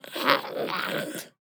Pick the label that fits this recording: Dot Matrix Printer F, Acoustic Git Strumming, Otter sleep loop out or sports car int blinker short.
Otter sleep loop out